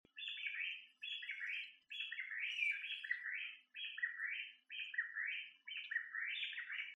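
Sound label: kojukei call, Chinese bamboo partridge; nakigoe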